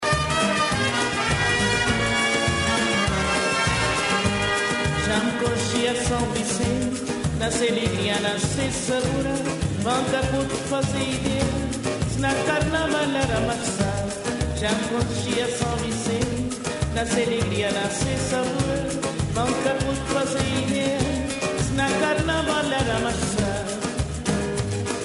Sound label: Samba